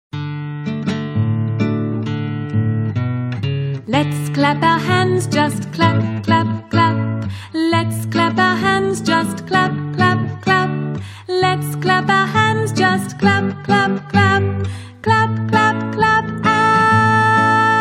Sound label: Clap Our Hands